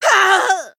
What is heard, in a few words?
Female Draenei Death